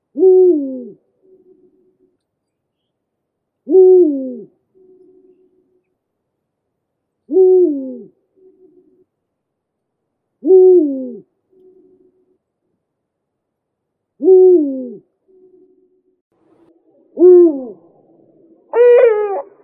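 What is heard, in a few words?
eagle-owl bubo bubo; filin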